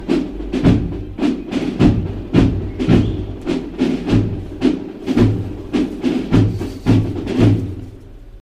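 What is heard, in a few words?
Drums Narrow Street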